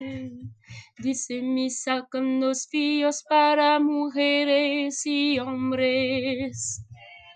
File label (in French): dice misa voix middle